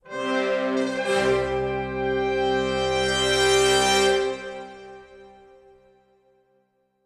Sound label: Music Fanfare; Fable Anniversary